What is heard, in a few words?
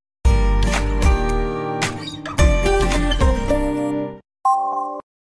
jingle pub; pub france 2 2023